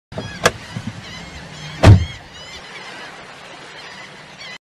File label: toyota logo animation